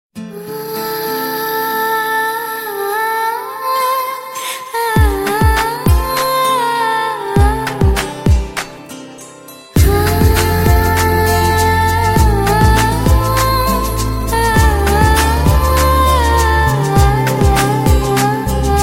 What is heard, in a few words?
female raag